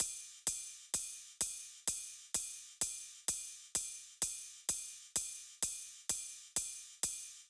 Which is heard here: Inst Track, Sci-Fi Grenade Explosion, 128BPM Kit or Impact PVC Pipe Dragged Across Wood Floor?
128BPM Kit